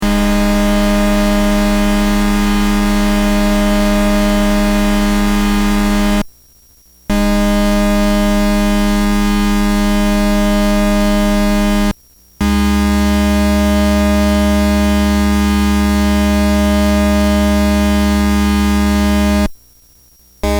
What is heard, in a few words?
minisynth noises